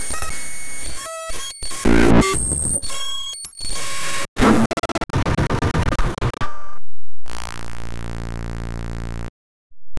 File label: a glitch sound